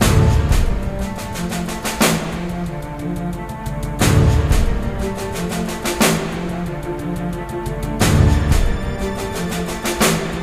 Heroism String Vamp

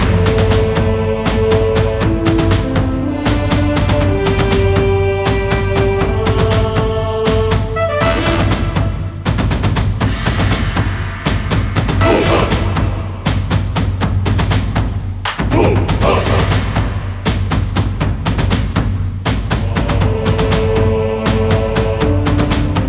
TENSION LOOPABLE

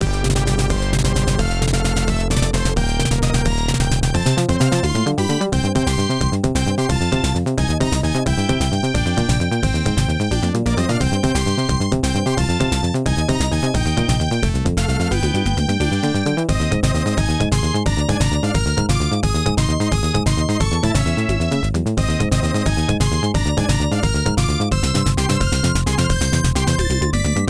Combat; Stage 5 BGM

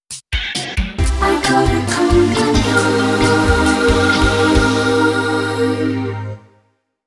Normal jingle; Terra Battle

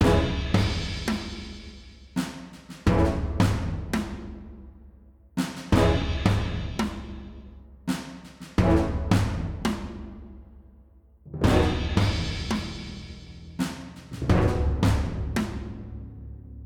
Town Music Loop

